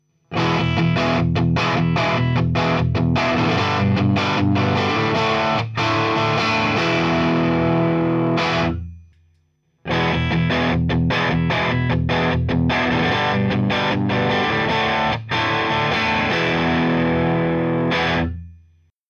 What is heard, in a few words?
Tele Dirty Bridge; Blond Cab; WGS; Reaper; Jensen Tornado